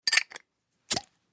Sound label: Beer Bottle Opn Opner